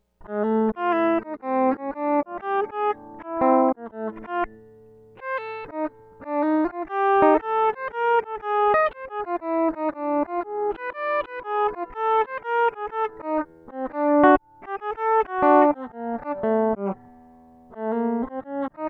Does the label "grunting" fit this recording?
No